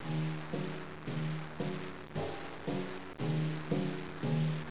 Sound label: tympani; 10,000 Graphics Pack Volume 1; Media Graphics International